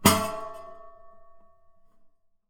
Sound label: Classical Guitar Buzz Pluck; Classical Guitar Extended Technique Stereo